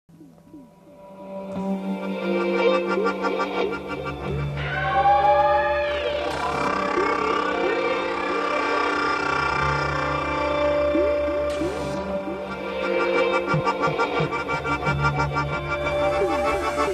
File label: ambiance; ExplosivE RSR